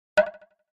Pop Up Hollow Tap

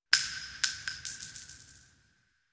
Shell Case Sngle Tube, shell casing movement

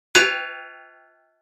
Impact Iron Grate; barrels buckets & cans

